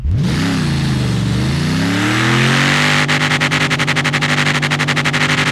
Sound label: first, vehicles